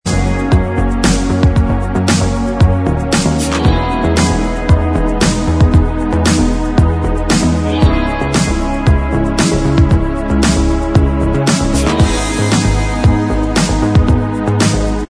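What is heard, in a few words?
instrumental, backing tracks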